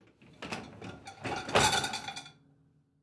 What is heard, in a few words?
Dishwasher Shelf Movements Dishes Rattle; household